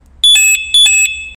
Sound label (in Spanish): desactivacion x28 M20; alarmas